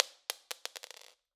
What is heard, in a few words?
Drop On Floor, Medium Wine Glass